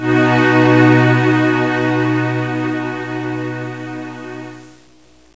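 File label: QUARTET B, Instrument; MUSIC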